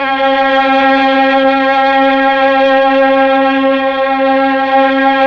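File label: Mellotron Strings C#4, Slow Strings; Keyboards of The 60's and 70's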